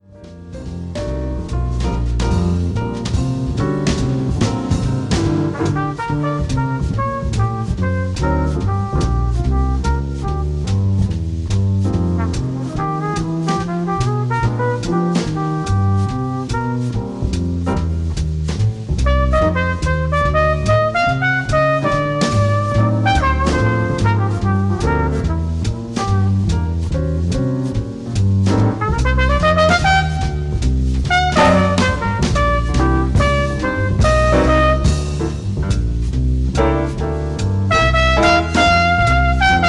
trumpet solo only

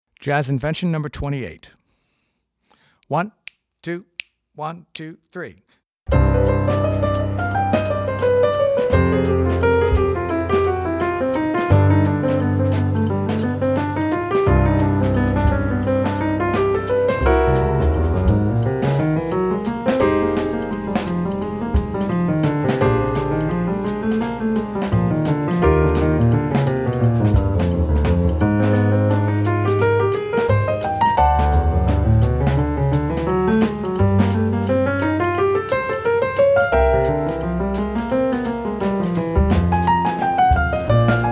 Jazz Invention No. 2

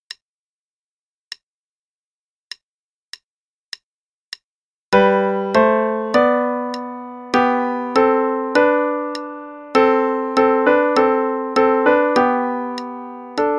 Melody, qn=100; Play-a-long; Kohler

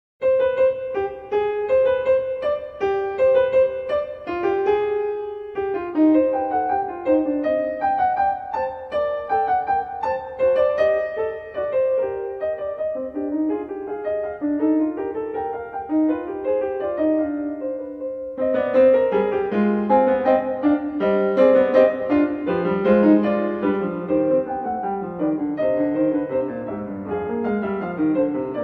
Fugue in C minor; Marlais Book 8

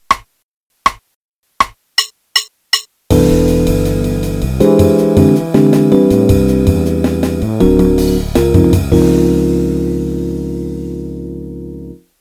C Major Scale, 1 octave qn=160; Play-a-long; Jazz Piano

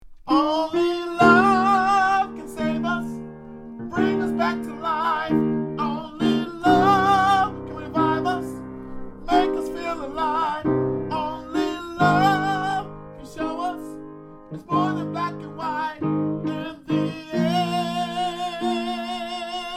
Alto

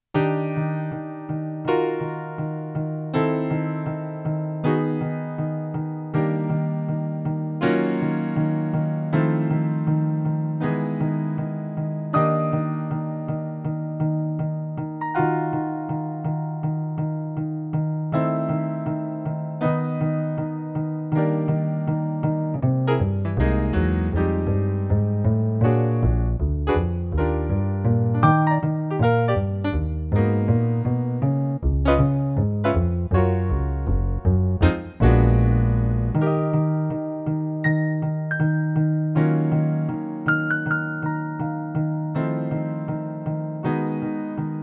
backing tracks, qn=160

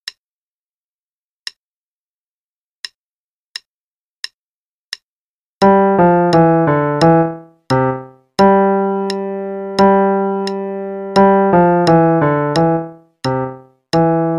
Old German Dance, LH only 2nd half, qn=88, piano; Play-a-long; Praetorius

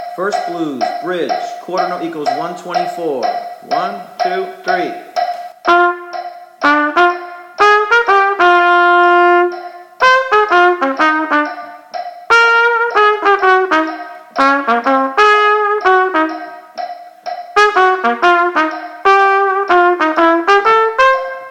Play a long First Blues with click, qn=124; section B; 12 Medium-Easy Etudes

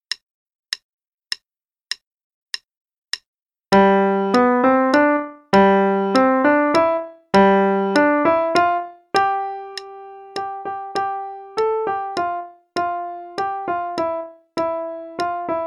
Waltz (st part, qn=100); Faber Adult Accerlated; Adult Accel Level 1

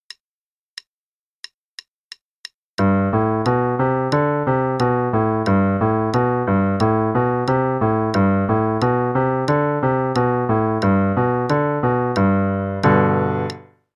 G Pentascale Warm-up, qn=180; Adult Level 1 - page 91

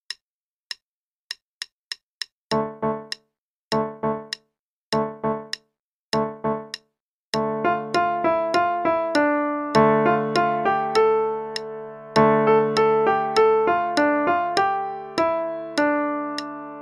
Forest Drums, hn=100; Level 1 - 2nd Ed page 36

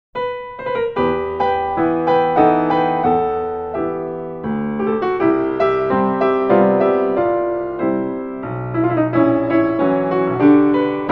Waltz In E Minor; Marlais Book 7

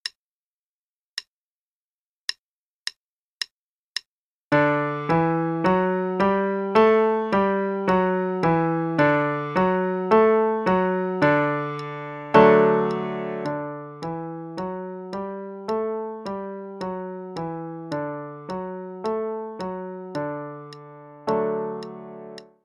D Major Pentascale (qn=108); Adult Level 1

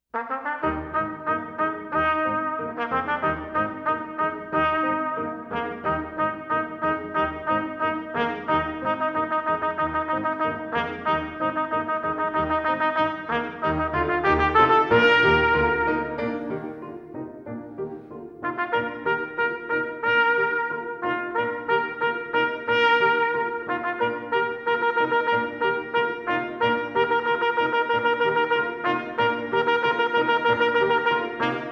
performance, Trumpet; I Am the Monarch of the Sea; canadian brass easy solos

